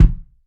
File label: bass drum one shot F# key